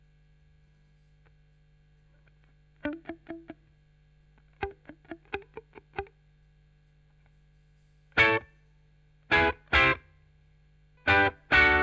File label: Chorus Gtr